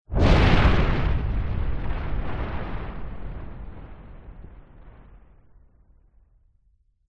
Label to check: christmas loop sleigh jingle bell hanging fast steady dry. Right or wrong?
Wrong